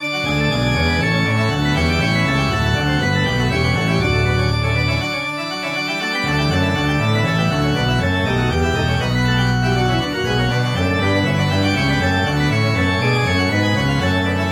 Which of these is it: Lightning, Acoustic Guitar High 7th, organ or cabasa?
organ